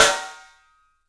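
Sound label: PICOLO SNRS, snare, drums; 3D Sound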